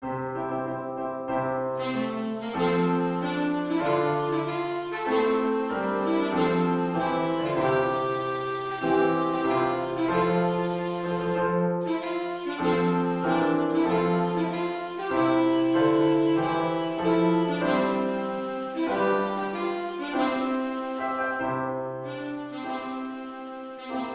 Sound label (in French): hymne national de Belgique; van campenhout francois